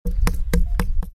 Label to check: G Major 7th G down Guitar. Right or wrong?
Wrong